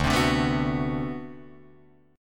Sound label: D 13th D, down, Guitar, Drop D